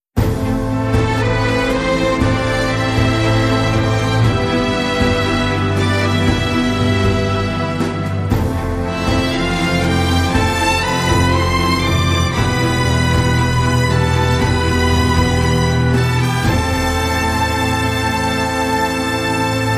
Epic Action, Light Version

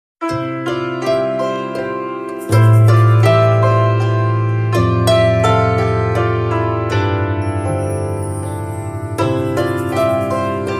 No Percussion

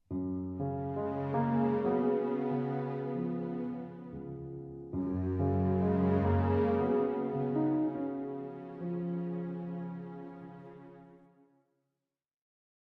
Underscore With Strings

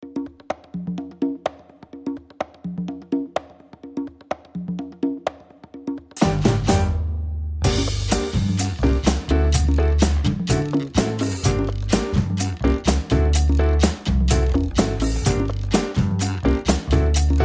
Cha Cha

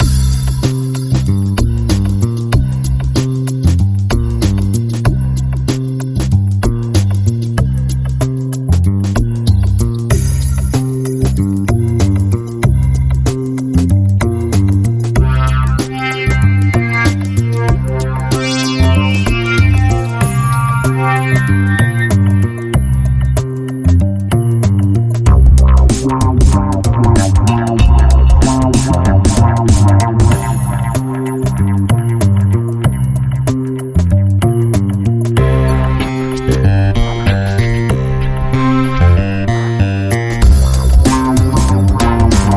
British Bass

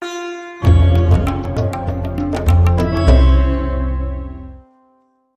Weeping Raga, Hit No Vocal

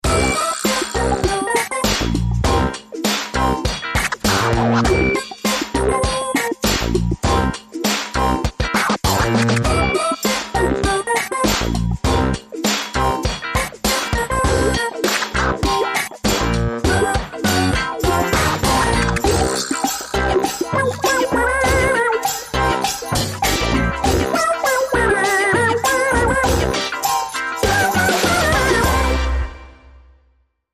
Zombie Techno Funk

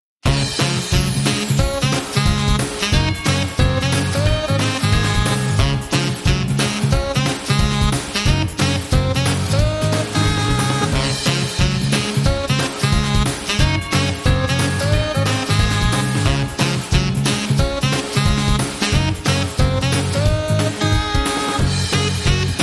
Joyful Jingle Bells

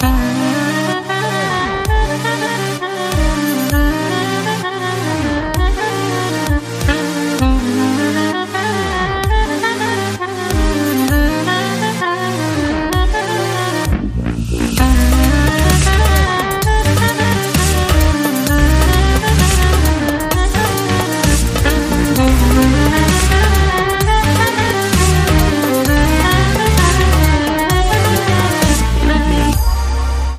Balkan Dubstep

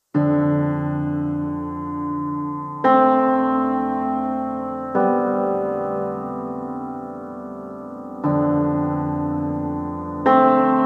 Solo Piano Mix

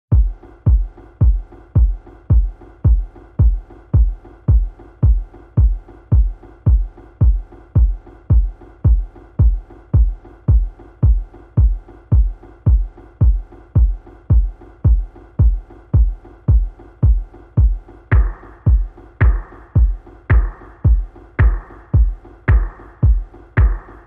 Drums and Bass

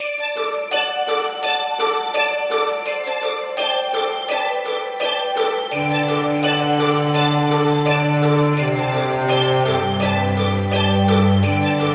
Christmas String

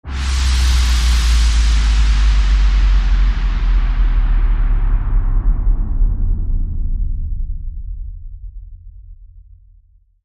Windfall Downer

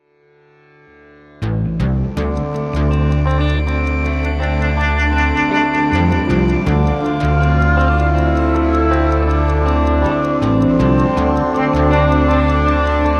Ambient

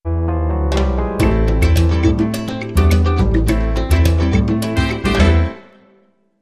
Sting No Vocals

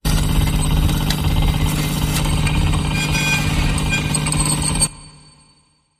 Broken Device

